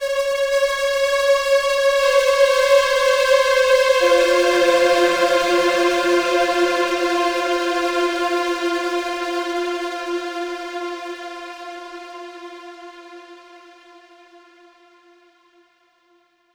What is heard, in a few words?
Overlay Tone; Act2 Rezoning